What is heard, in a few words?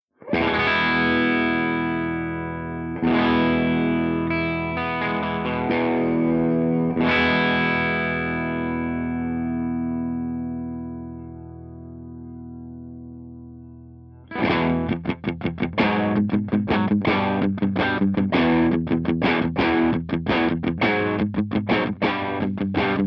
MARSHALL JTM60 CH2 CRUNCH HB